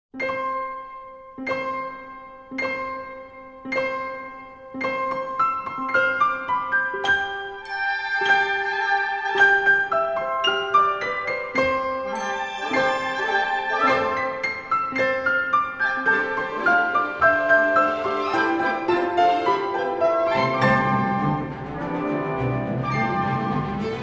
Concerto For Piano & Orchestra - Mvt. 1 Vibrante Con Brio; Caio Pagano